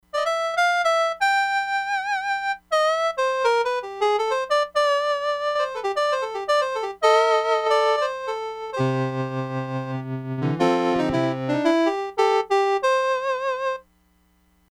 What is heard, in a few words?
HARMONICA, Crumar Bit 99; Factory Programs